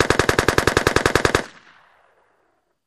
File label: Machine Gun Sngl Rap, single burst